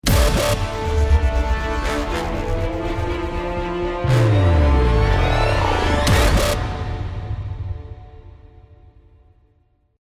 lost round

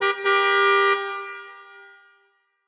car honk distant

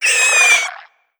death, headcrab, creatures; headcrab classic; vox

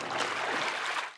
wade, footsteps; player

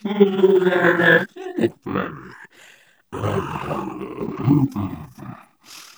ballbot tbeam massage; player; Portal 2

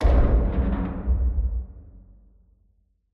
ambient tenement oil drum, metal

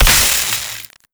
electric zap; player, damage

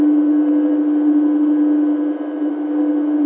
lab loop, ambient tones

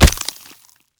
creep damage; Counter Strike Model Pack; CS:GO; survival

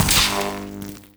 buzz zap, electrics; creatures

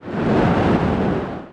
wind

